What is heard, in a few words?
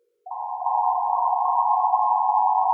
overcharge; wisp